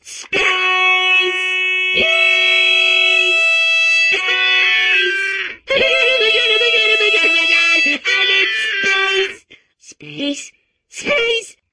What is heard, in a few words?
remix; portal space